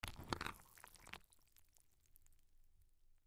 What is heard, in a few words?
Cereal Spoon, Cereal Spoon Into Bowl Food; Household